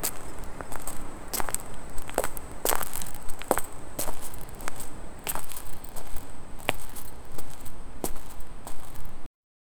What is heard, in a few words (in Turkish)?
ayak sesleri step tap tap